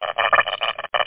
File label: Teleport; worms dc; tykes